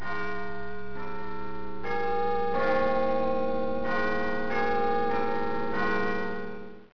Big Ben, clocks

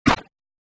evil; Virtual dj sound effects and plugins; Sampler

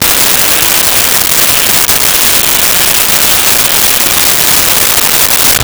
Raspberry, Comedy Vocals; Vintage Cartoon; SONY PICTURES SOUND EFFECTS SERIES

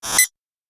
zip, notification; notifications; Nothing OS 1.5

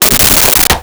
Swirl; Comical Effects; 1001 Sound Effects

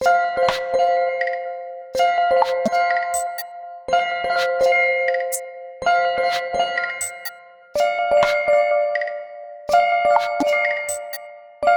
Tinkle, alarms; LG; P940-Prada-3.0

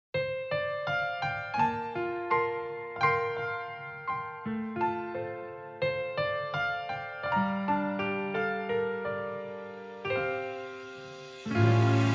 without Vocal; Gundam 00

